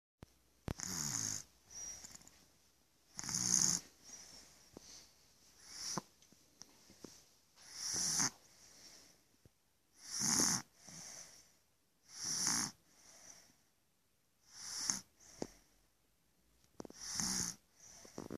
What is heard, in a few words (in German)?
Elisa Schnarcht